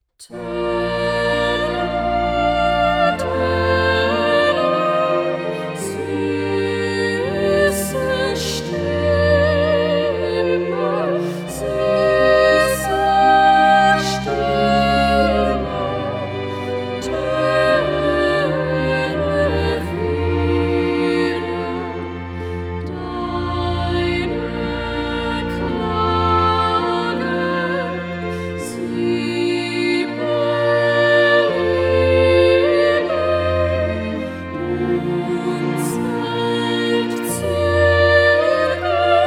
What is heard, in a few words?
Light Opera Trio GERMAN